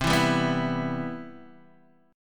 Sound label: C Major 7th, Guitar Half Step Down